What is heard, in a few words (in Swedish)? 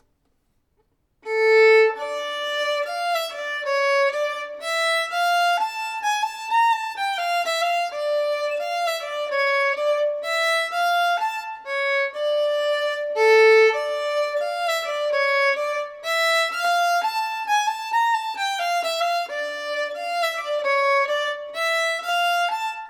Vals tempo